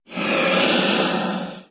Lion Hiss Single Deep; big cats

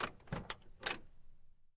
Camaro Door Open Ligh, chevy camaro

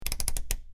Rotating Switch Clic

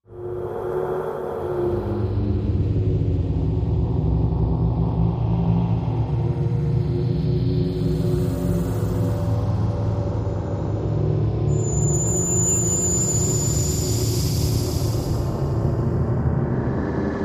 Breath Room Cold Deep Ca, eerie ambience

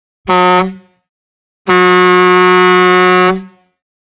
Horn Truck Horn

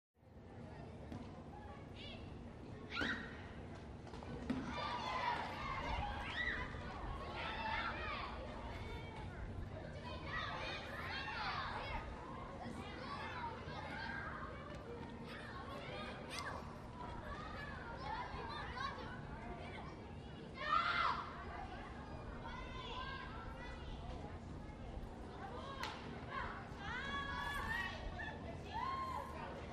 Playground - Schoolyard; schoolyard ambience

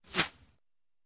Arrow Sizzle Bys Ligh; bow & arrow bys

